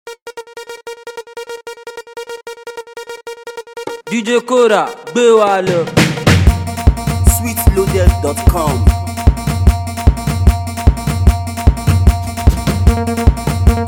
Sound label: Mara Beat; Happy